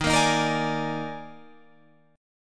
D sharp Major 7th Suspended 4th D sharp Guitar Drop D, chord; chordshape; 13,13,13,15,15,16